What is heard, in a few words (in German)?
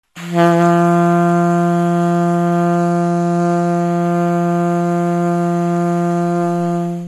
Altsax F3